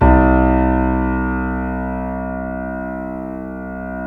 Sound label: C7 PP, The Ultimate Piano Collection; East West